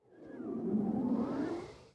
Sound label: FLARE